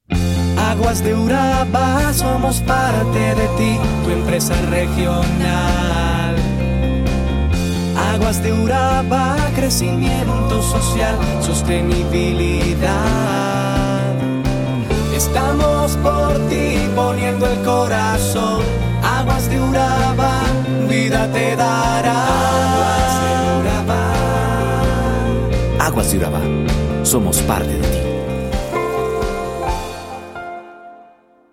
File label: JINGLE; AGUAS DE URABA